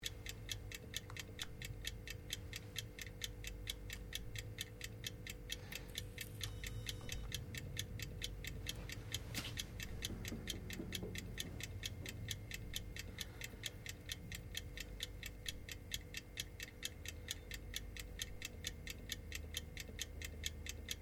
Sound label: OVEN FANFORCE TIMER OPERATING LOOP, Common oven sounds; Appliance Sound Effects; Appliances (Sound Effects:Household)